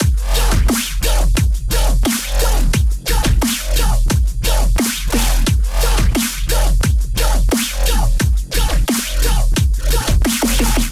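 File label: Music Confirmation box Loop; Crayola Scoot; Robbie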